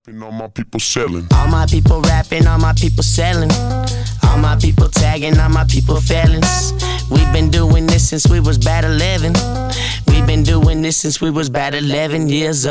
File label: HIP HOP